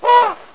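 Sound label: elephant honk; ANIMALS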